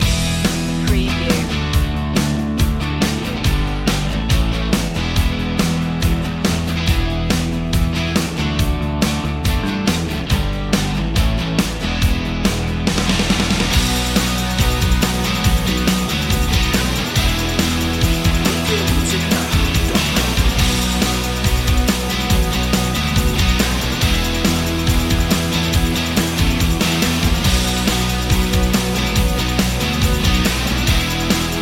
rolling free loop

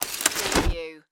Umbrella Open

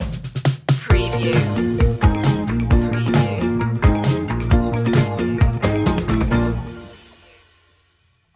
playful comedy stinger

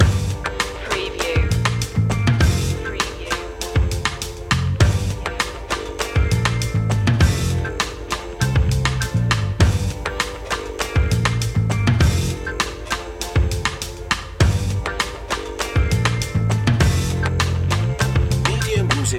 funky chill story loop

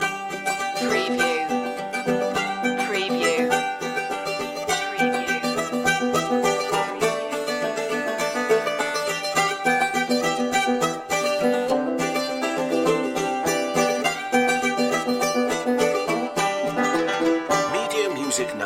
strings loop

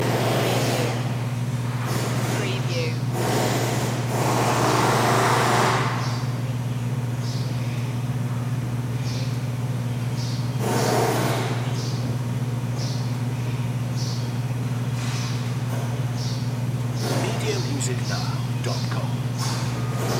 Auto Body Shop

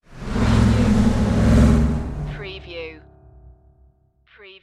Dark Production Whoosh

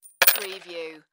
Coins Set Down Table

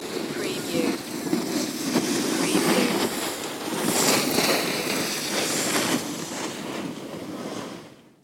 Snowboard By

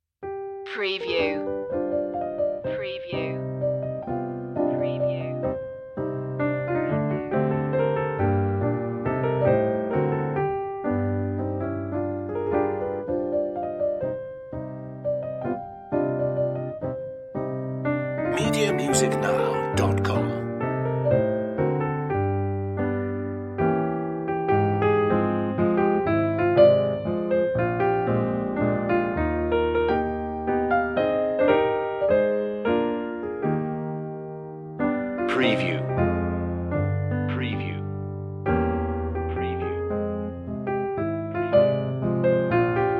chopin mazurka allegretto non tanto; op30 no1

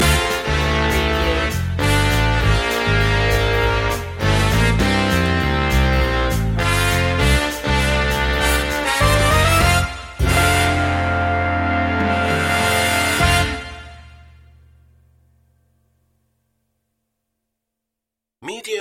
take the b road loop end

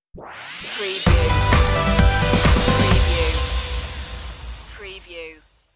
neon stinger

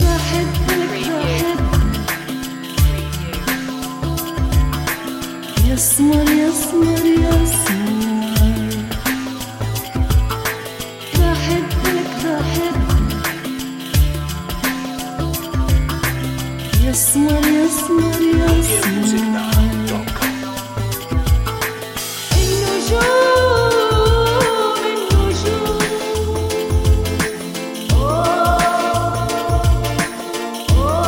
electro world wide syria loop

